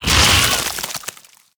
Squirming Tentacle Death

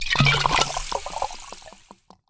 Potion Underlay

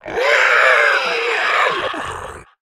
Infected Quillflinger Death

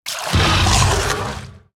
Loan Shark Attack

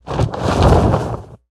Dragon Wing Flap Large Death Underlay

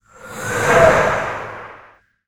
Dust Devil Attack

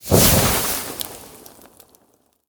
Torch Flare Up; Goons Torch

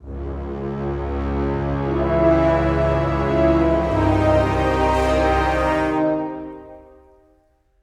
Stinger

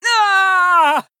Female Troll Death

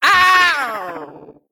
Female Mech Death

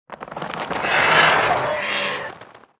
Many Whelps Play

